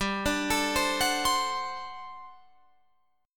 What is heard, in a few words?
G Major 7th Suspended 2nd Suspended 4th G down Guitar Open F; GM7sus2sus4 arpeggiated